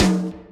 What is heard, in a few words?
tom, drums; virtual drum sounds; custom drums